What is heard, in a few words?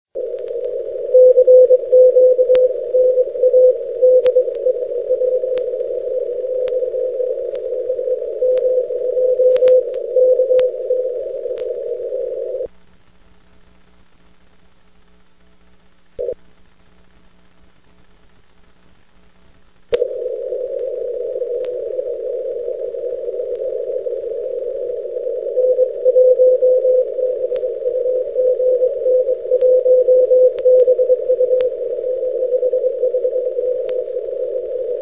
6m; My QSO; JA opening; 6 Meter; 25 June 2011 - JA opening!